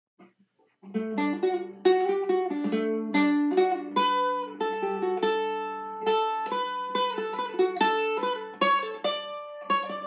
Cloud Forest waltz

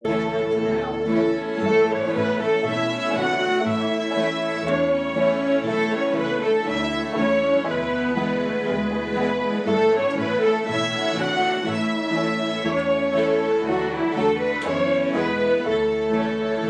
Cradle song

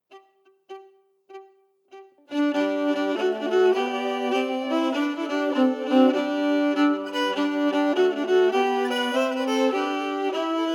Piano audio harmonies emphasized; Barbs

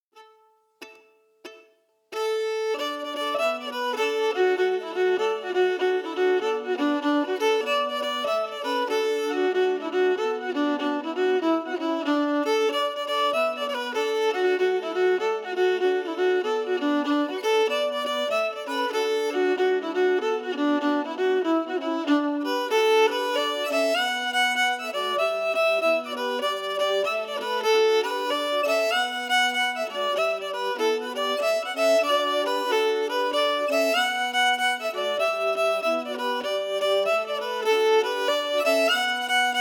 melody emphasized; Munster Buttermilk

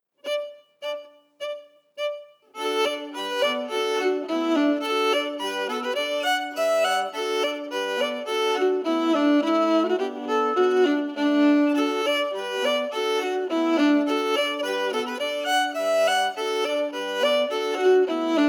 Birds At The Feeder Melody

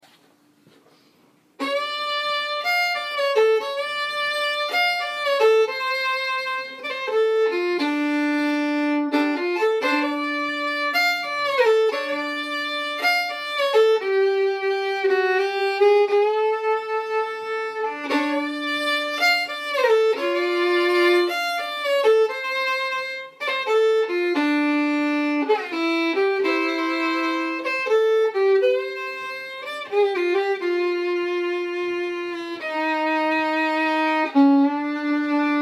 waltz

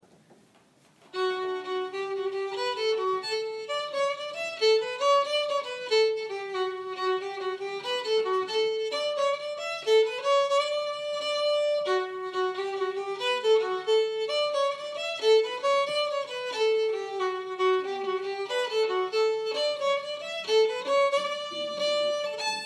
Jig

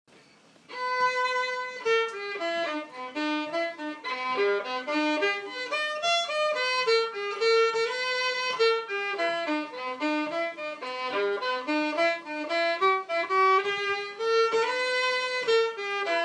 Jig

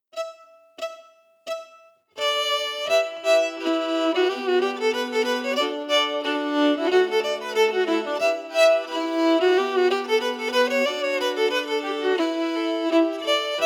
melody emphasized; The Old Grey Cat

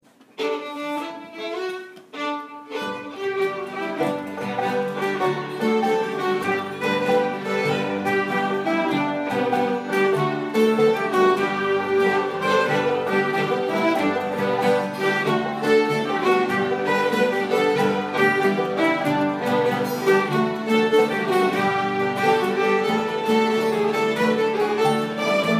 Jig

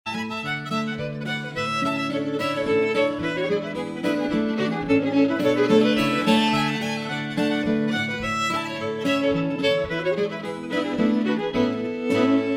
Polska High

